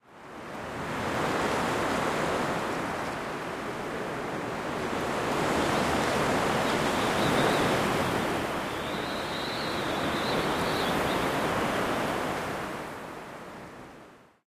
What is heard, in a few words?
jungle wind trees; Source Engine; tf; lair